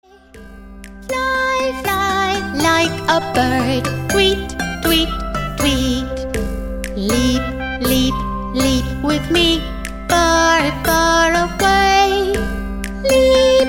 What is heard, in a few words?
Spring Animals Actions song